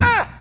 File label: unnh, Voice; drums single hits